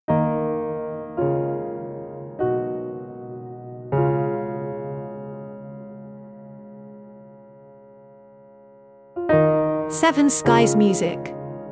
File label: solo piano